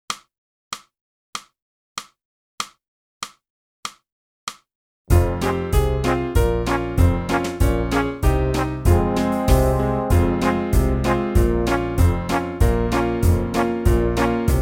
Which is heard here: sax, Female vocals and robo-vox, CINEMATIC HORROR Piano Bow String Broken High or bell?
sax